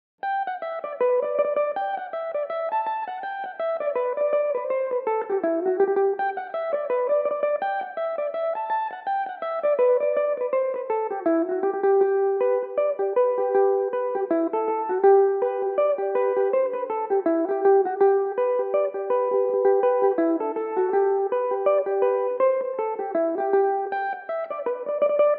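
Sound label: G major belmuse, irish mandolin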